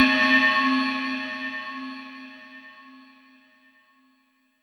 LONG GONG; FILM KIT; Drums In Your FACE!

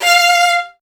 Virtuoso Strings F2; ILIO; Kirk Hunter Virtuoso Strings VOL-1

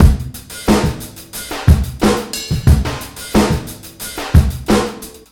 Street Tip, R&B Drums

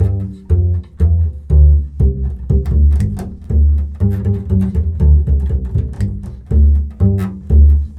Bull Fiddle, Appalachian; Continental Drift Aftershocks

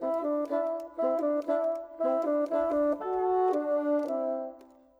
Bassoons, Orchestral; Sonic Foundry (Sony Creative Software); Orchestral VOL-2; Modern 05

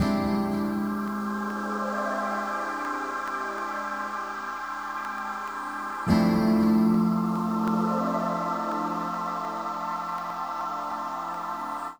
PAD, Hip Hop and R&B; Best Service ProSamples vol.02; AKAI

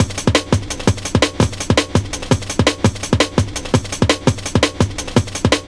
LOOP, Explosive Jungle

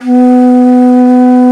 T. BANSURI; Winds; Bansuris; E-MU Producer Series Vol. 7 – Old World Instruments

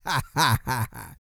LAUGH, Jazz; Spectrasonics Vocal Planet; Jazz FX

